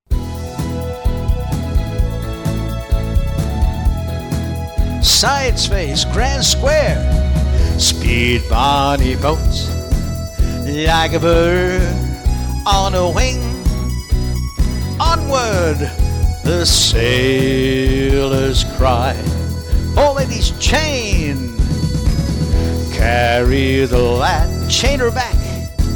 vocal

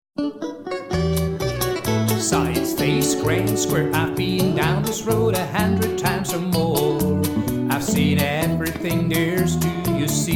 vocal, square dance, mainstream

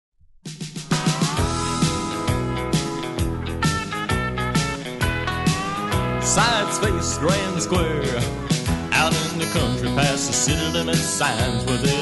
vocal, square dance

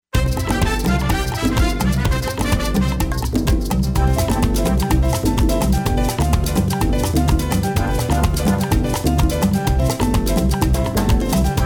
rhythm track

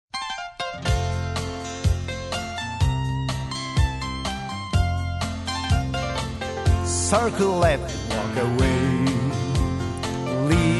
vocal

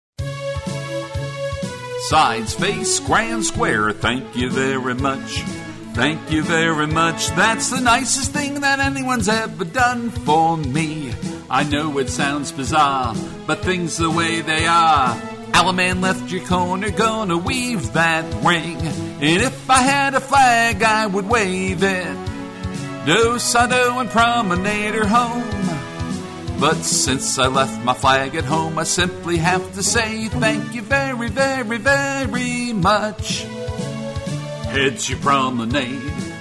vocal, square dance

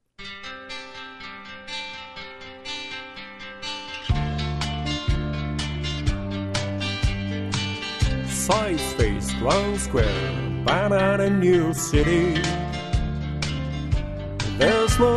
vocal; christmas

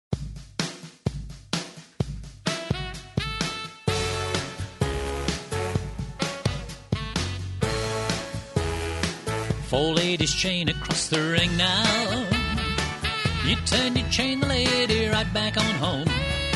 vocal, square dance, mainstream

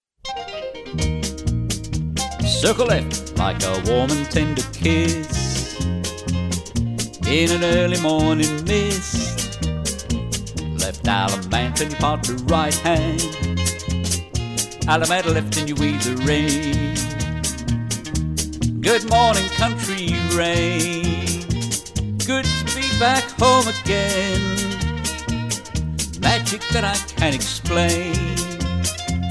vocal

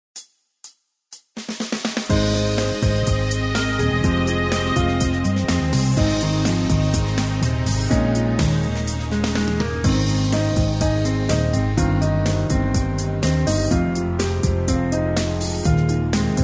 male range

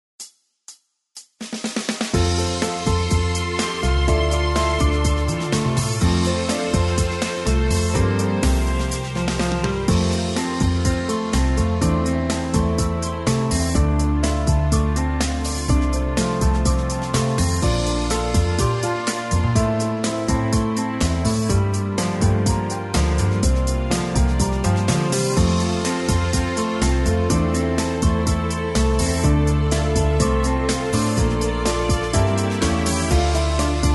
female range

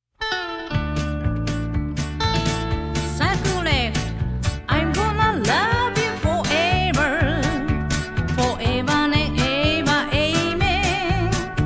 vocal

